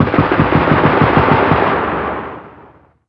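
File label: gun 50cal distant; ModernWarfare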